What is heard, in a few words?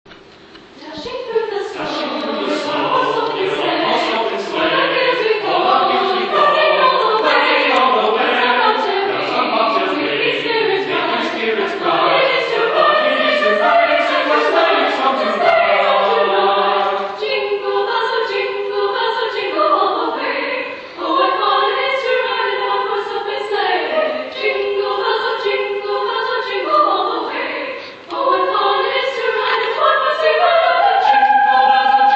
Jingle Bells, acapella; Sherzo